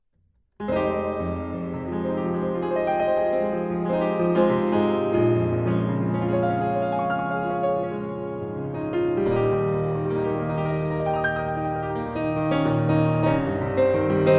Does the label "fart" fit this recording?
No